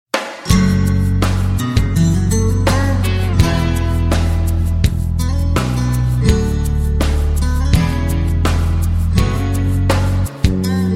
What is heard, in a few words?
soft guitar ringtone; samsung